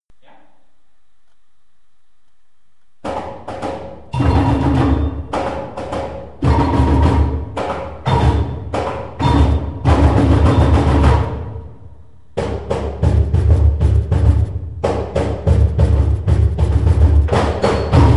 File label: Samba